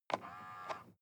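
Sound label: sports car interior side mirror adjust very short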